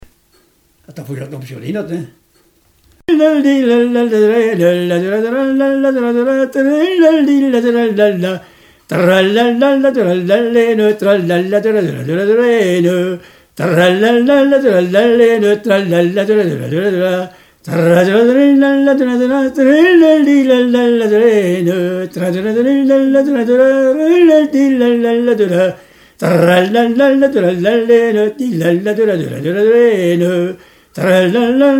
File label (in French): Air de polka à la voix; Airs à danser